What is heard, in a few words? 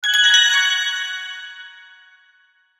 Logo Bells Reverb; Logo Bells Reverb High; Sonic Logo